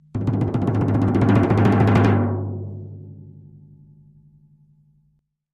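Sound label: Drums Toms B Roll Crescendo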